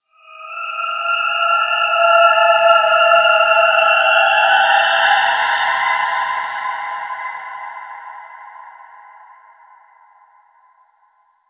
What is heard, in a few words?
Space Exterior Dist Call; Ambience; Space Battles; Warframe